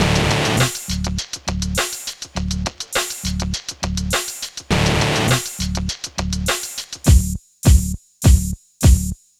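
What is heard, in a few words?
Pop Electric Combo, Dmaj, 102 bpm; Digidesign; Pro Tools; Pro Tools Essential Loops; Pop Rock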